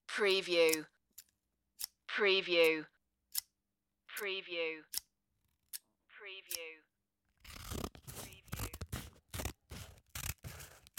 scissors cut paper

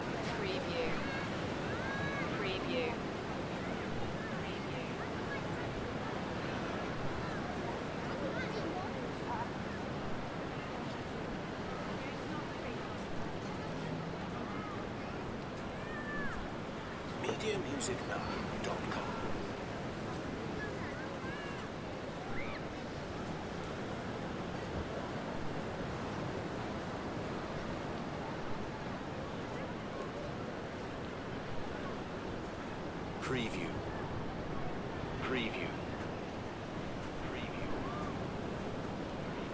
Spanish beach; beanstalk audio

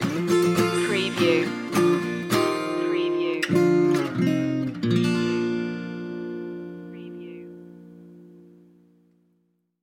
barn raising stinger

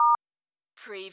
dialtone star

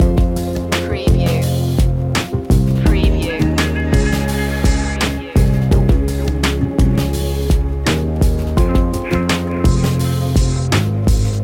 up in smoke loop

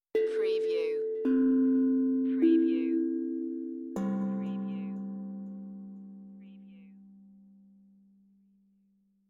Tongue Drum Meditative Tone; beanstalk audio

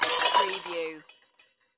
Crash Pots and Pans

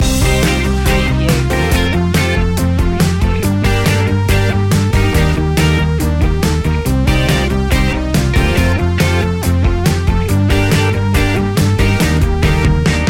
full city loop

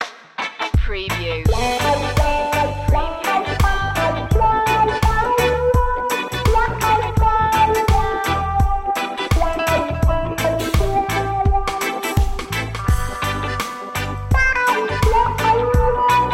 sunny reggae

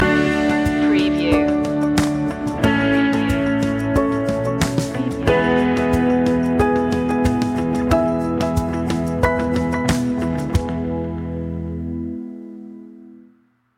rumble in the jungle

